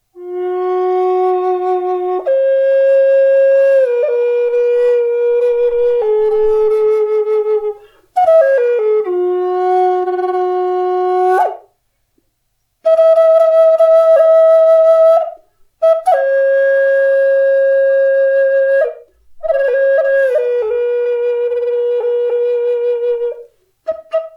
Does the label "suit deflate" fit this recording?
No